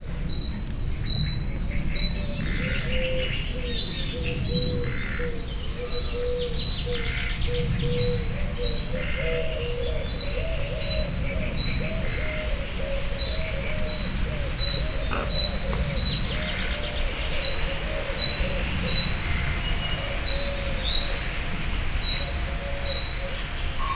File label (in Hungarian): fülemüle riasztóhangja; szegedi zoo 2011